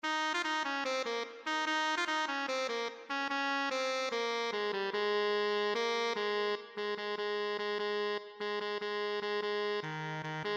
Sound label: tenor saxophone